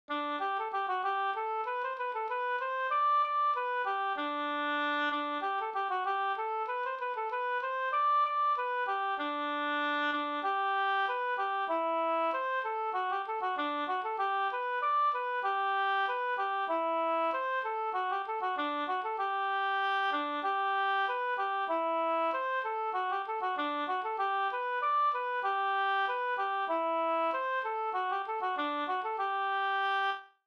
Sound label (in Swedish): ganglat; Frustunamarschen